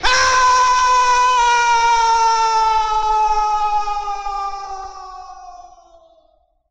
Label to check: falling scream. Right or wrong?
Right